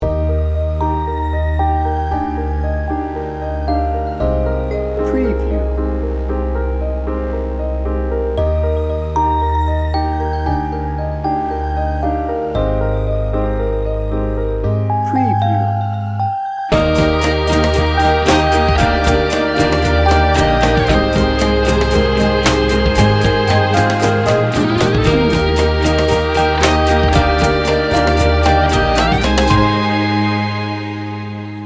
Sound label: motivating and positive 30 second